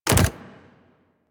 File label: Land Default, Foley Footsteps, Pets; Warframe; Zanuka; Zanuka Companion A